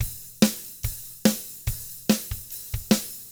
ROCK; ILIO; Double Platinum Drums 1

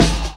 Snare, Percussion Hits, Snares; Zero-G Jungle Warfare 1, 2 & 3; 90 s Sample CDs

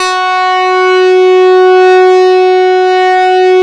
leads; Masterbits; Vintage Voltage

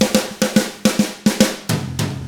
105 POP RK, Drums; ILIO; Double Platinum Drums 1

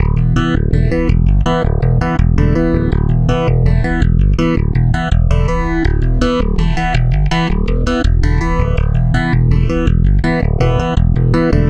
Stick Loop, Stick Bass

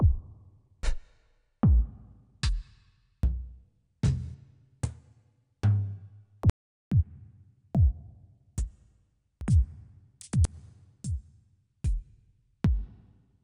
Yamaha Tenori-On Bangkit

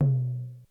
MedTom, Korg DSS-1

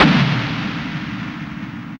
BANG

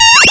Percussive, Drums Perc One Shots

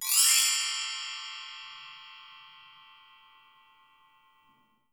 Percussion & Harp; Miroslav Vitous

